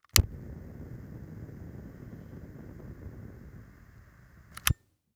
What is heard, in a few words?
Household Lighter Big BBQ Lighter